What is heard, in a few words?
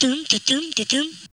VOX2; Grunge Hip Hop; USB Soundscan vol.34; AKAI; TIMBA